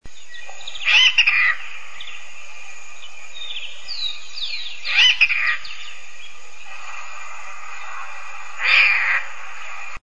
voz urraca

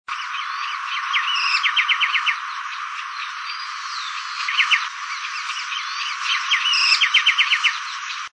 voz anambé común

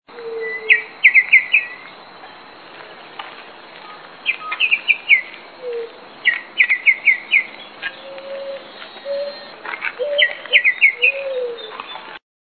voz anambé común

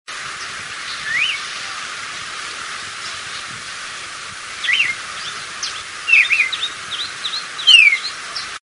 voz cardenal común

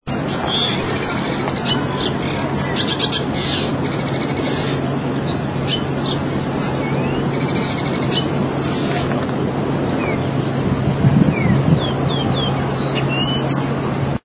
voz boyerito

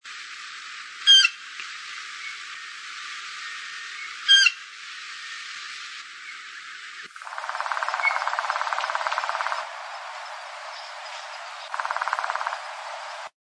voz urraca

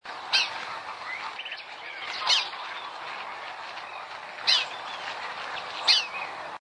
voz cardenilla

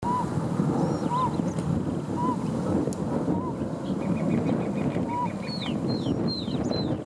maca pico grueso voz

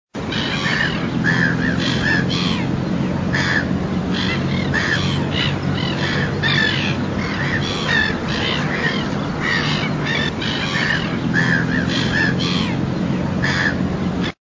voz gaviota capucho café

